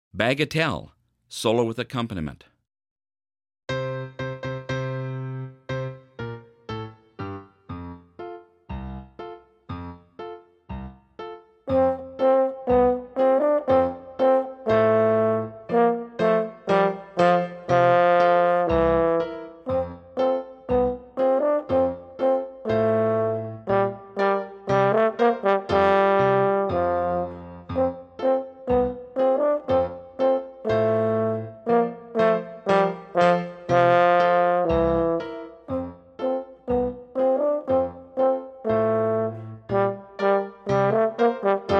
Horn Solo and Accomp; Bagatelle

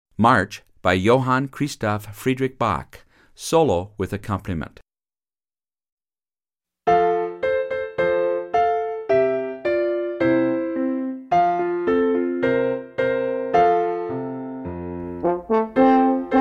Horn March Solo and Accomp; French Horn

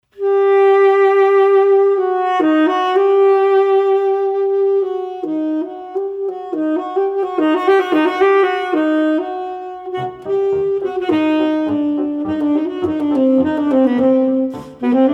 allegretto ten sax perf

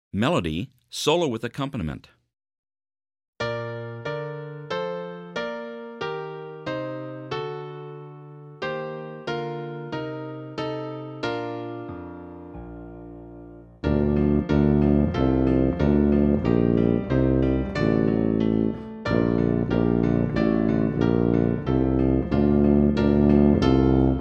Melody Solo With Accompaniment

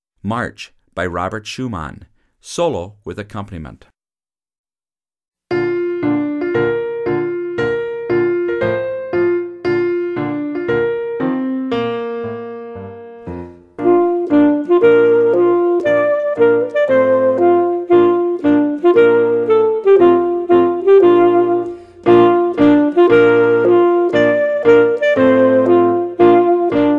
Solo and Accomp; March

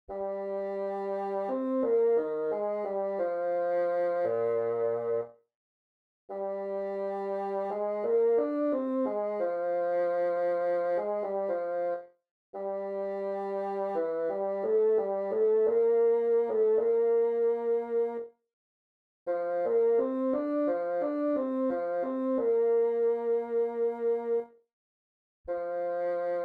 Bassoon

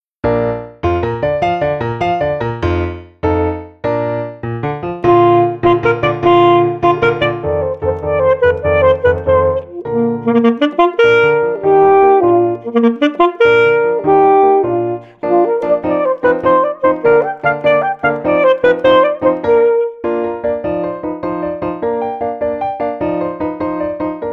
Woodwind Hunting Song; Solo with Piano Accompaniment – Performance Tempo

